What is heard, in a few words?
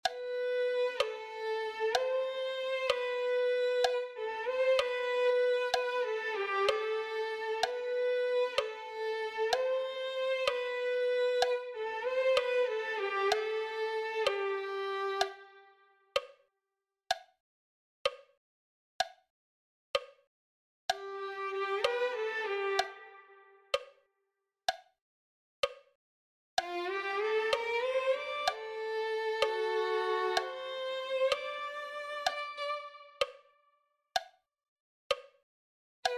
Violin; On Vstal Z Mrtvych